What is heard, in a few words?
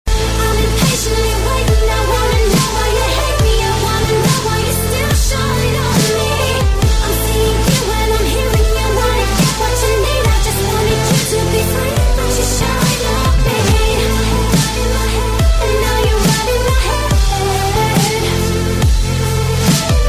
Dubstep Vocal